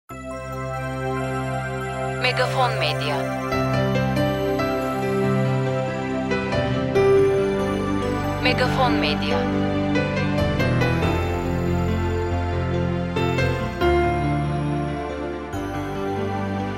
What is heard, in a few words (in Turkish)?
SANTRAL MÜZİK